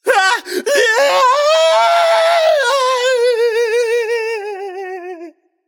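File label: nonverbal fear; smite; voicepack; merlin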